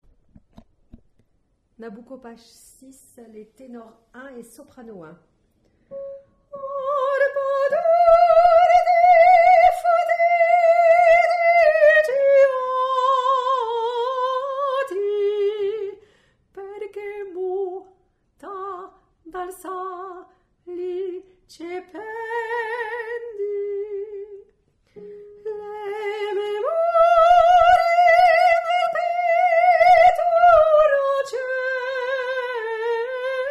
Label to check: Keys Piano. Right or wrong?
Wrong